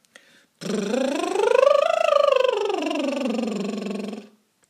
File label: Lip Trill